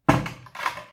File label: close Cabinet; luxury decor